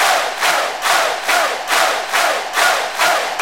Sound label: Crowd Effects